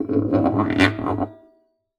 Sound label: Classical Guitar Extended Technique